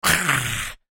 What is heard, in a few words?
Enemy Swarmer Death; overrun